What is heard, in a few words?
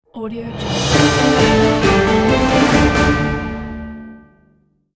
dramatic orchestral breaking news jingle